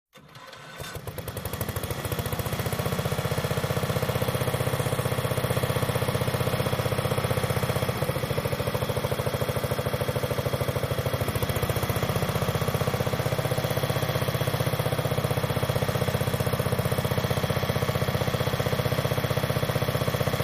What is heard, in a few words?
Water Pump On Idle Off